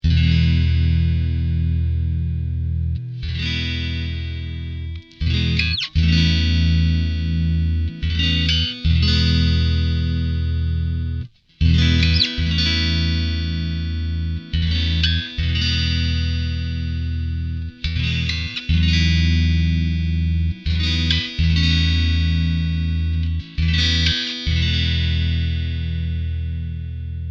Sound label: pig iron clean neck; axetec